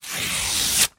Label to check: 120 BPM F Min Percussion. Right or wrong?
Wrong